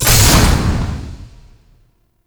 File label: Standard Door Open; Warframe; Entrati